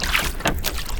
deep water; terrain; draugnorak

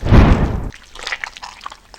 step, terrain; draugrhaugr; draugnorak

